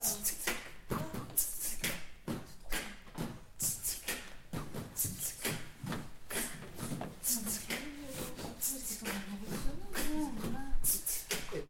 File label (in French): exercice de beatbox